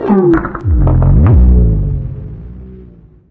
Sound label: strider hunt; npc; source engine; episodic